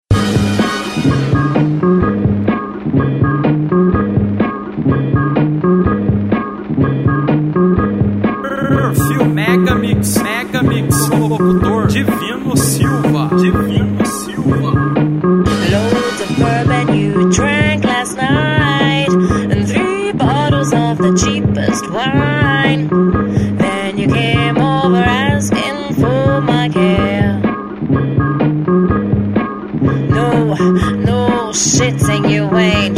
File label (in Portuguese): mega mix locutor